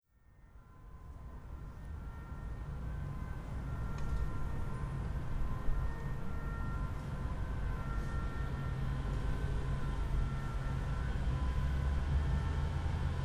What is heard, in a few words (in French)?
sirene parc